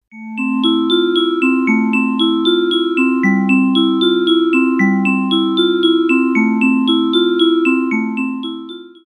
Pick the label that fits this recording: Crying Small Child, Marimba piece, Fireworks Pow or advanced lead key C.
Marimba piece